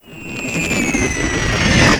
mortar incoming; Explosions; modern warfare 2

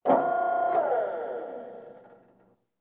ac130 hydraulics; amb elements; modern warfare 2

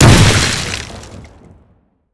explosion door wood breach; modern warfare 2

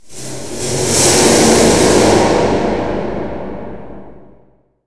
metal; modern warfare 2; amb elements

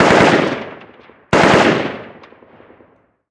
gun ak47 med; amb elements; modern warfare 2

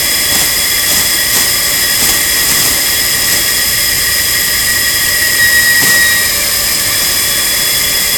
propane gas leak loop; modern warfare 2; amb emitters

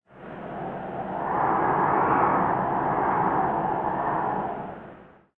wind gust mono; amb elements; modern warfare 2

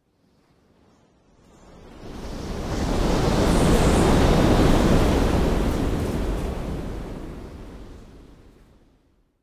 wind buffet; modern warfare 2; amb elements

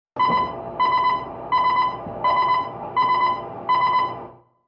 ac130 beeps; amb elements; modern warfare 2

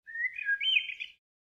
bird; modern warfare 2

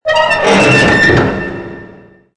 metal; modern warfare 2; amb elements

